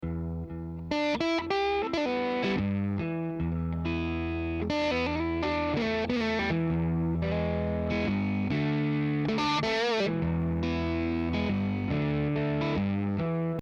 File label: waves